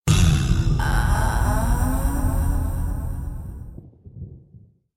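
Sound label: sms breathing; lara kroft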